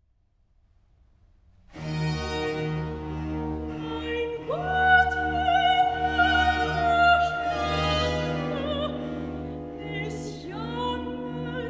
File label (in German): Recitativo (Sopran); Mein Gott wie lang ach lange; BACH; BACH for SOPRANO